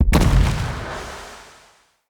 Casting Device Projectile Destroy Heavy; Weapons; Player

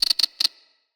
Ext Insect; Midrath Island

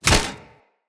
Unarmed Punch Impact Flesh Armour Heavy; Weapon Impacts; Player; Combat

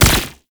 Concrete Archgun Projectile Explode; Warframe; Archwing

